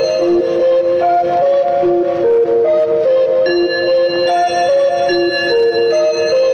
swoop on in, loops electronic

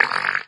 fart short

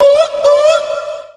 "oohwah oohwah", voice; ooh ahh

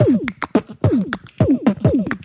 swing it, Beatbox; Drum loops

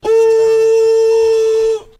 "ooh" male; ooh ahh

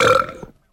burp short

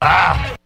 "ah", Voice ooh ahh

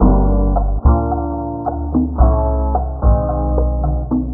guitar, Loops

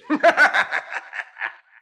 laugh devil

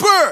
brrrrrr; Voice; ooh ahh

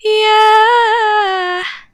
"yeah", sung

